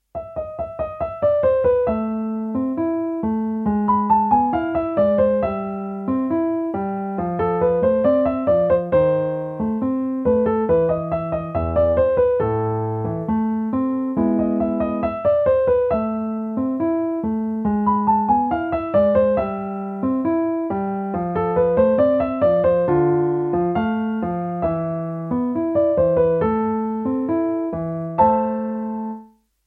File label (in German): Tango; inspiriert von Beethoven